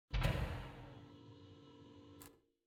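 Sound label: City SFX, City Environment Streetlamp Buzz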